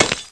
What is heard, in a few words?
rock crack; Ferazel's Wand Demo